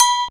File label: Agogo Lo, Yamaha RX21L drums